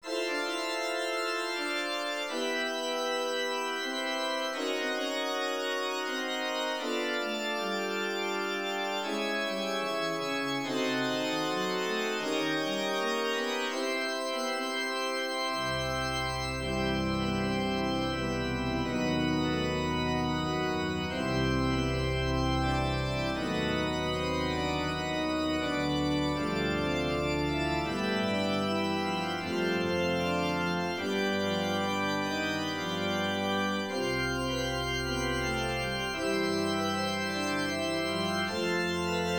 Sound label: Autumn Fanfare